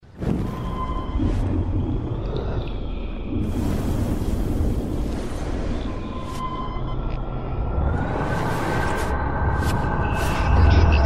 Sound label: Rewind; Life is Strange; PC Gamer UK